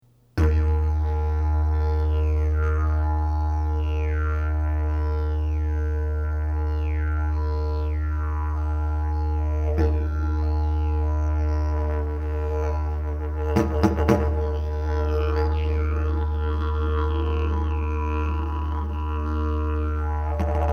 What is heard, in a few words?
didge